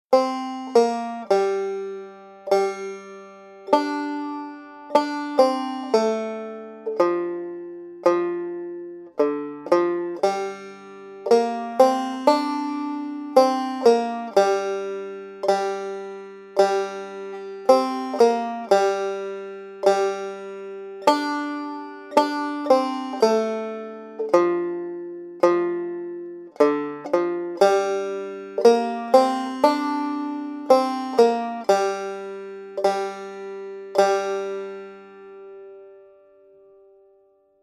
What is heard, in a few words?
Melody